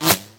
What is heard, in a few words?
bullet miss; civ13; civ14; Weapons; Guns